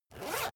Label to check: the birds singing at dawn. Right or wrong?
Wrong